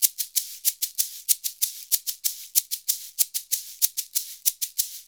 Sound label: SHK, Shaker; Univers Sons; Basicussions